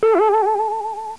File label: boing